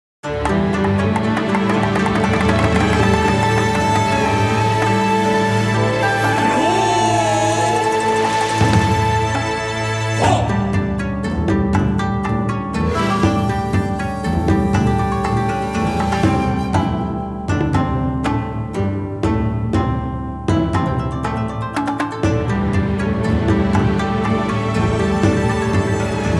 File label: National Anthem; Advance Wars 1+2 Re-Boot Camp; Gold Comet